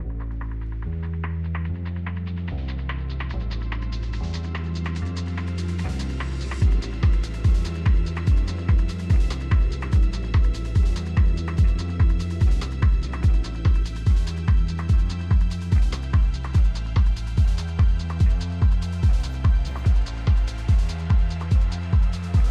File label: Intense Cardio Workout Soundscape; Endel